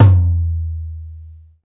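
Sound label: tom low, Roland; Club-50 - Foundations Roland; Ext. Snare 4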